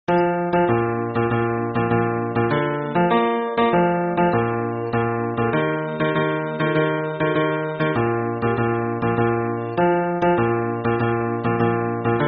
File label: hymn bass